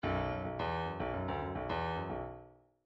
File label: bdf midi file grooves funk c funk c